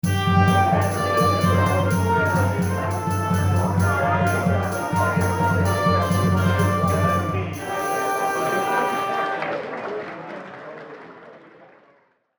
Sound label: Acoustic